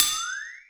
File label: EQ Perc, Percussion Mix